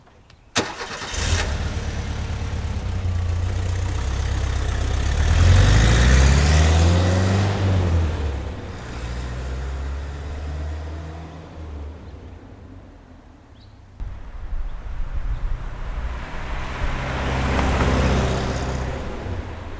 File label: Drive By